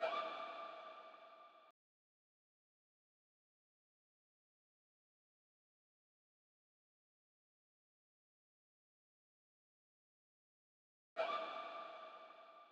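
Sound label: Simple Melody Loop - 86 BPM D# Min Vocals; Vox; Cymatics; Oracle Sample Pack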